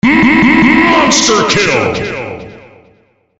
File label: mmmmm; svencoop; FZSaySound